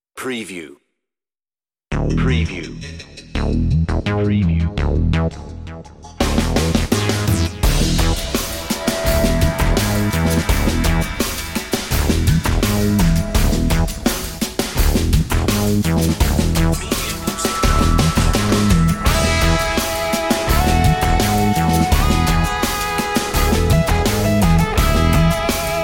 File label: on-hold music